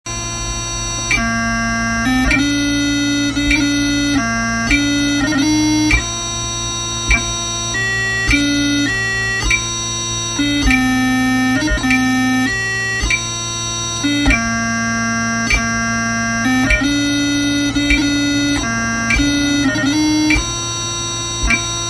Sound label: Green Hills of Tyrol 50 BPM, piping; highlanders; piping tutorial materials